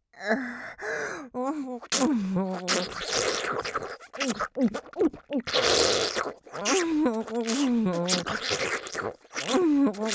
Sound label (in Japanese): ちゅぱ音